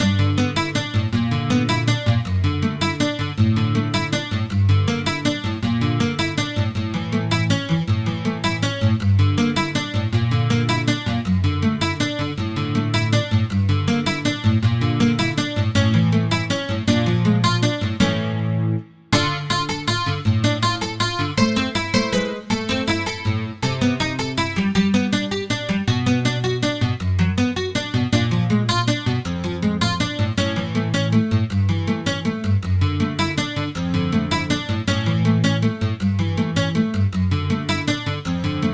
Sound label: midi; broons bane